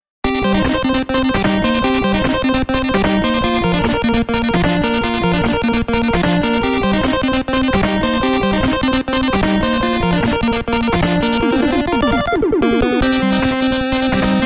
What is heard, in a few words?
mmsoundtrack; Mega Man 2; gamesoundopedia